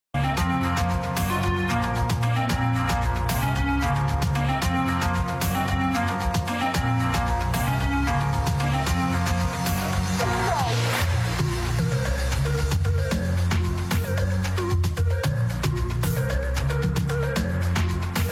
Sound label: Set Amapiano Live Mix